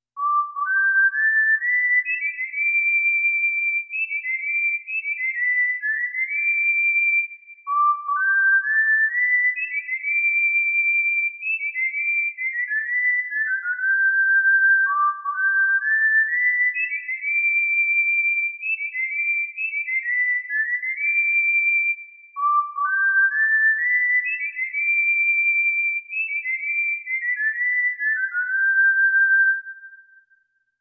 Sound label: whistling; SQ6